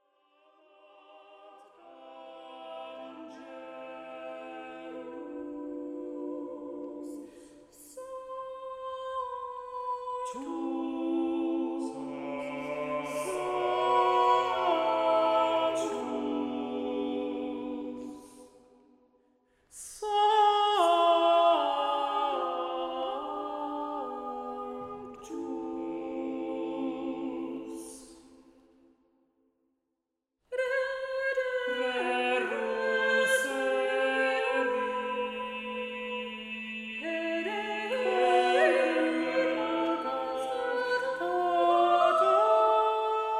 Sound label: Sixteen to Four (SATB) Fragment Workshop; acoustic compositions